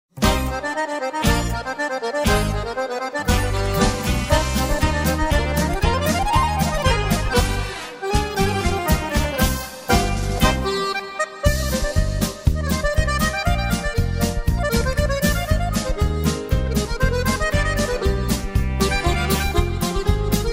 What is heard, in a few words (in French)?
musette